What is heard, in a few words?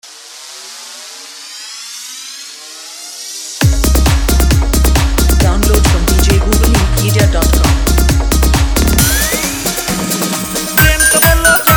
Siren Mix